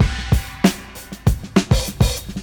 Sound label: 96 bpm HQ drum loop A key; Duration 0:02; Samplerate 44100 Hz